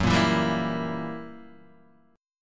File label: Gb 7th Flat 9th Gb Guitar Open D